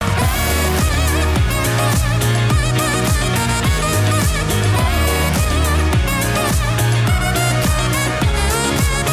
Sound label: epic sax